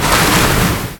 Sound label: Atk Merfolk